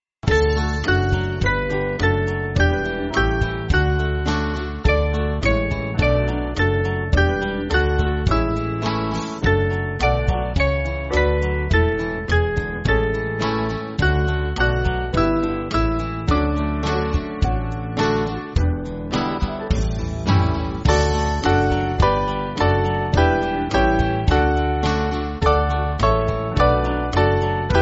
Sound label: Band, Band; Gracious Spirit; Capetown